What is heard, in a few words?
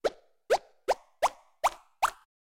Cartoony Bounces, boings